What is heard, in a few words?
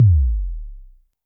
korg trident